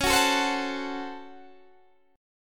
Db Major 7th Suspended 4th Sharp 5th Db Guitar Standard; 21,21,19,17,19,17; DbM7sus4#5 strummed; JGuitar